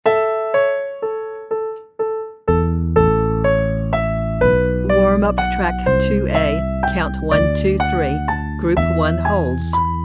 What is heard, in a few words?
Vocal Warm Up Count 123